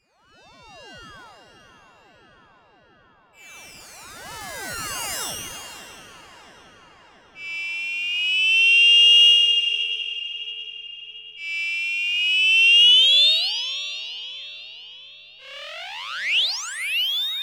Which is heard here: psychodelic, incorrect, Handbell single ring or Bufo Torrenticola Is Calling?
psychodelic